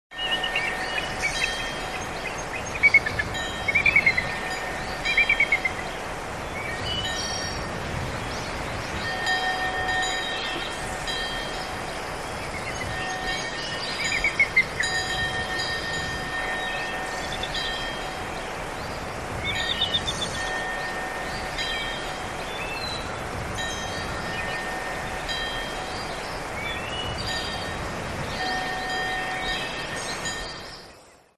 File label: samsung galaxy s10, dzwonek